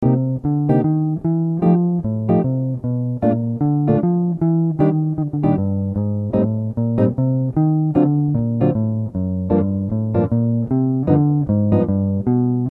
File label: chord